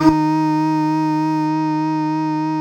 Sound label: voxy D#2; Best Service Alpha Dance vol.2